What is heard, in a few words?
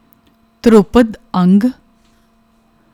dhrupad ang; Dharupad Ang; shabad kirtan presentation